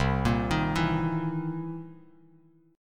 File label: C 7th Sharp 9th C Bass Standard; arpeggiated; JGuitar